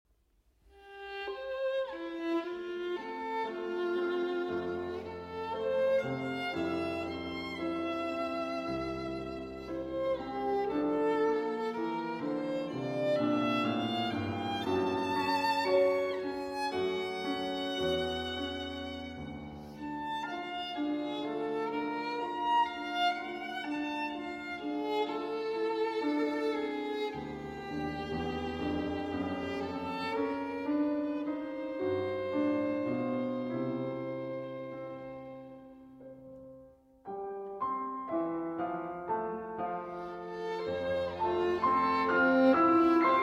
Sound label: Violin Sonata in D Major, Andante